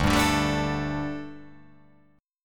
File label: D Suspended 4th D